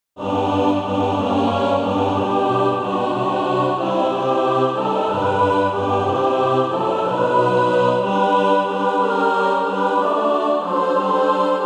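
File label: mixed, SATB Practise Music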